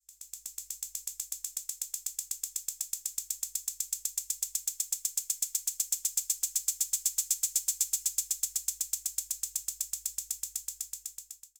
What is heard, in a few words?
Elektron Analog Rytm HH Basic Transient Decay